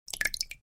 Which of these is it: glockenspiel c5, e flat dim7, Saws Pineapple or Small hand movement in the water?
Small hand movement in the water